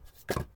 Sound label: Wood touch; Phasmophobia